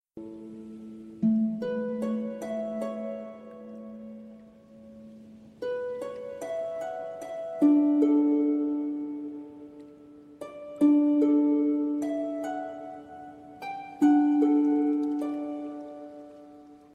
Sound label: harp